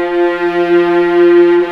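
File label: viola arco F3; East West; The Ultimate String Collection; VLAS ARCO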